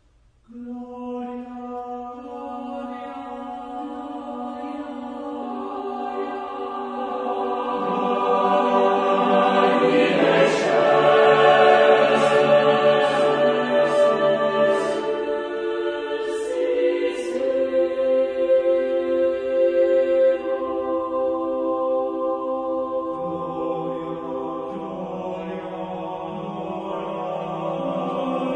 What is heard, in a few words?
Mass for Double Choir Gloria; Martin